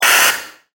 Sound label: Drill Holes